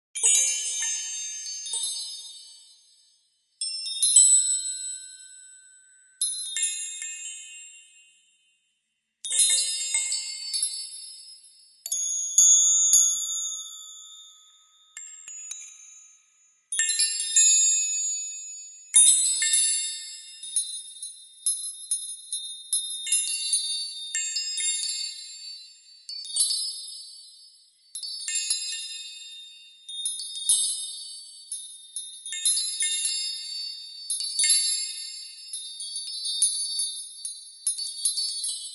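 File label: magic chimes; Перезвон колокольчиков создаёт волшебные звуки; Взмах волшебной палочки, сказочные звуки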